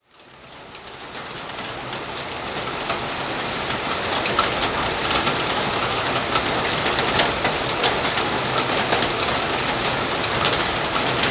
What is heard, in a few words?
Rain on roof; metal roof